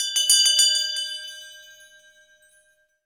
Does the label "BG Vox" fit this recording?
No